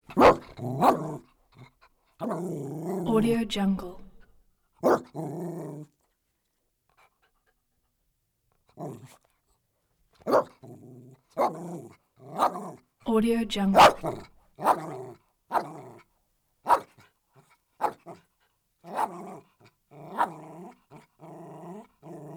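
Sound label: terrier small dog close up snarly barks whines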